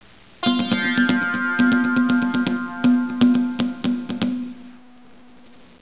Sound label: mideast